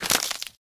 cookie crash; tangguo

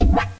miss

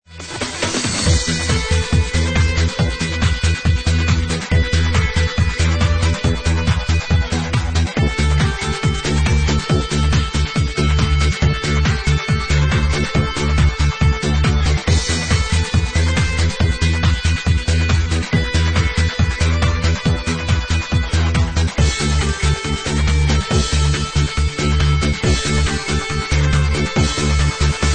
In Game

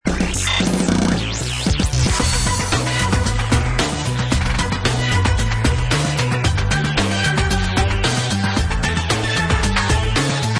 main; game

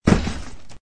box

